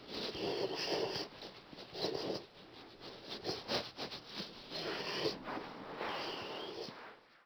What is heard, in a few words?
sleeping; Rust